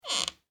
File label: Horror Door Squeak Creak Short; Creepy Door Sound Effects; Creepy Doors (Sound Effects:Horror)